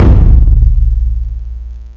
drum hit finish

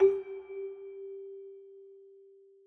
Celesta Low G

